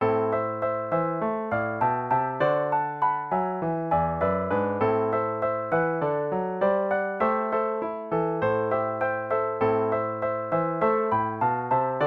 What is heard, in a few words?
Piano